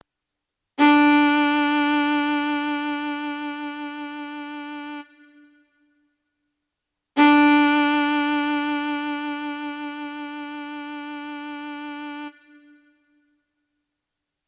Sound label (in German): viola d